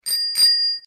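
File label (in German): Fahrradklingel Schildkröte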